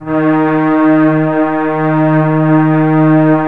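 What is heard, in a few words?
M1 STRING D#1; Northstar - New Gold VOL-1; M1+D50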